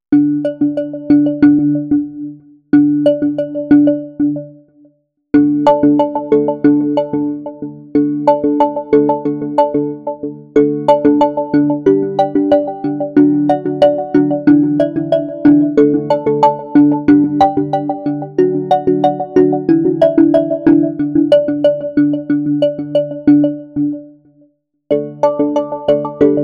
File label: Cuckoo Clock